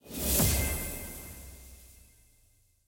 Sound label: small Win; goldengrimoire